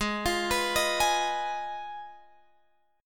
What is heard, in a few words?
Ab Minor 6th, Ab, Guitar, Drop D; Abm6; arpeggiated; 18,20,21,20,21,x